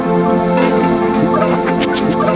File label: NOISE; DEF OF NOISE; AKAI S6000 CD-ROM - Volume 1; CYB POLIS